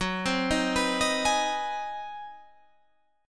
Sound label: Ab 7th Sharp 9th, Gb